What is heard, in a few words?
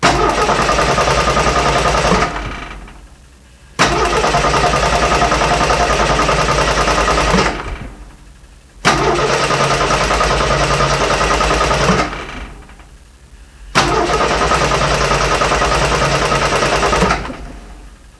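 bad street, cars; transpo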